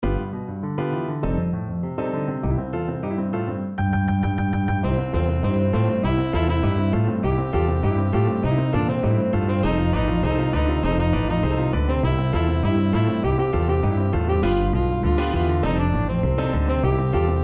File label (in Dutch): zonder zang